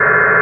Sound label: piano Buzzer